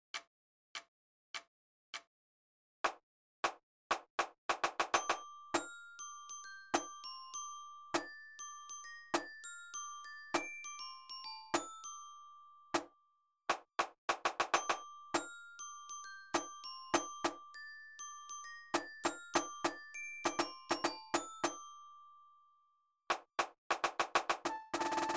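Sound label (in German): Trommel, 100 bpm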